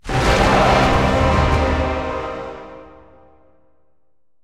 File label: battle end fail; War Thunder